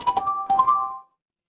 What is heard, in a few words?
feedback success